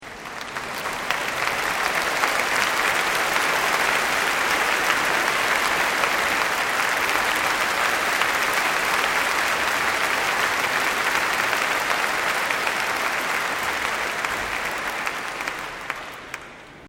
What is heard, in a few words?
applause